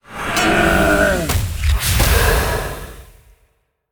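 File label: Wind Guardian death; monster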